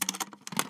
box struggle, weapons m249; Urban-Coalition; Anarchy; arccw ue

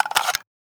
mag out, m16a2, weapons; Benny Placeholders

